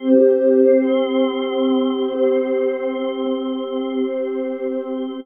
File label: Pad; Dream Zone